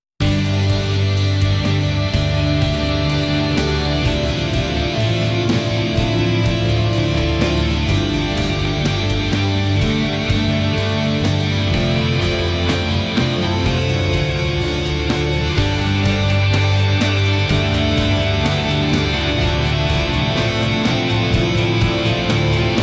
post rock guitars drum kit bass strings euphoric up lifting moody flowing raw epic; sentimental